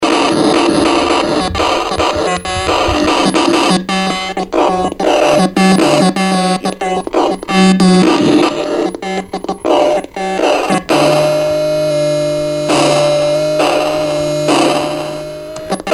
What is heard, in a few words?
Bent HMP-139, Circuit Bent